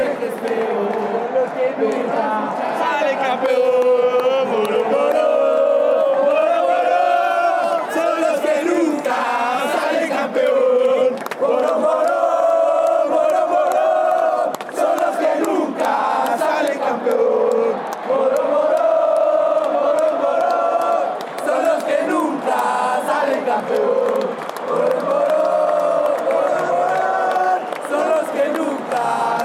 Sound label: poro poro chant